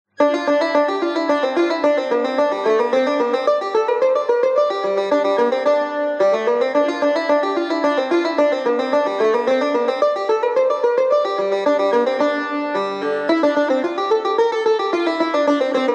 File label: study of nava tar; second book